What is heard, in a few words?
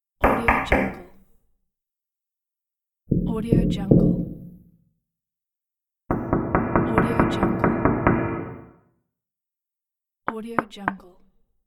knocks on door